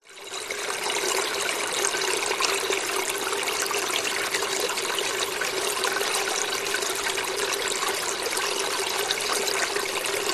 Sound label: nature waterfall cascading water flowing gently between over rocks